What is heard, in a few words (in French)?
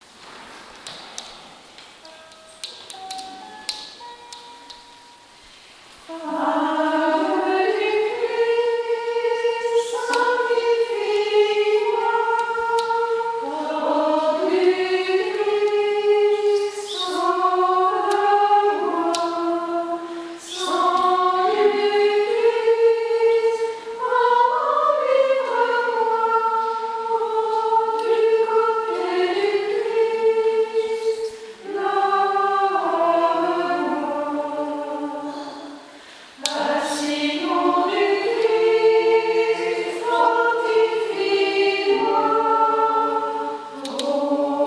Chant d Action de Grace